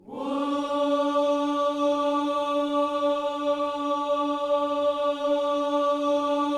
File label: "Whoo", Gospel vocal, D4; Spectrasonics; Vocal Planet Vol.1 Gospel; akai